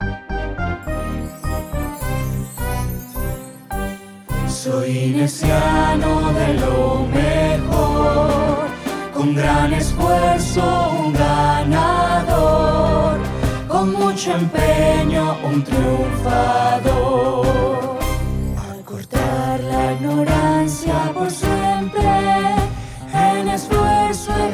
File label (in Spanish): Himno